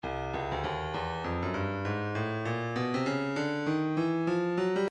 stylus breakbeat midi 099 bpm

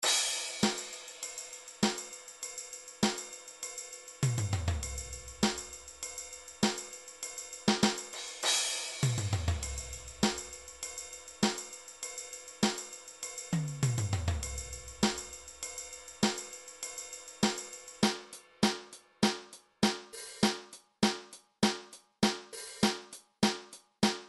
midi drums brit rock

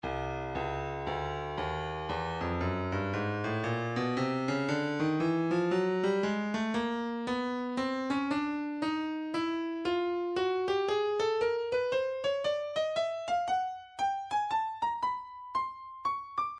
breakbeat midi files 050 059 bpm; Stylus; 058 Wallfly A

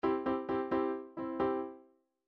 chord lines chacha; Midi Files 1000

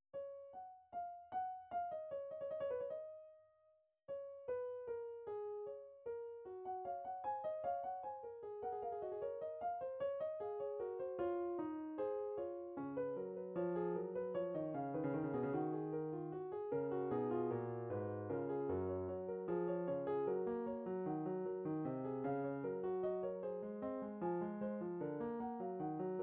classical fugue, midi

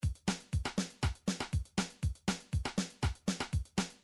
drums, midi drums

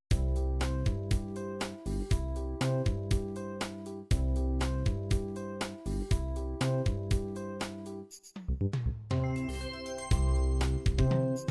Ballad 8beat, midi

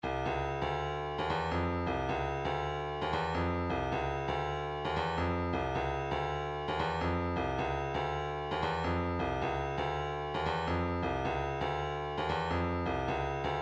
kick, midi

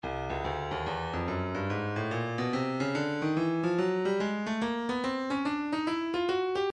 breakbeat 072 bpm; slow jammies d; Stylus Breakbeat Midi Files

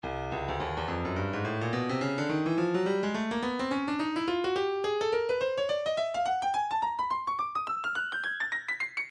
breakbeat 106 bpm; edifice a; Stylus Breakbeat Midi Files

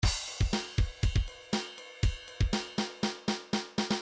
rock 2 bar, midi

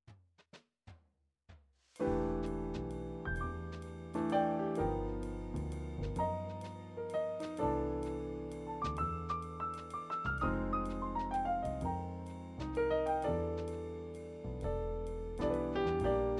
jazz, midi

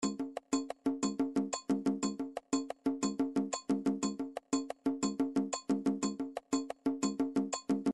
midi drums jongo

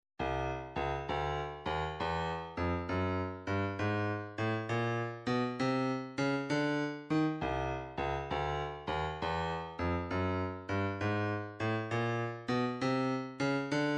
133 hihat; Soweto

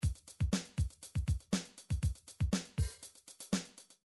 midi drums

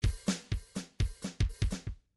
midi grooves pop

